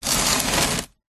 foley cardboard box shift movement on gritty hard surface; zapsplat